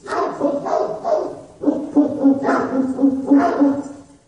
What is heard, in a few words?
Big vicious hounds; SyrinScape; Missing Magistrate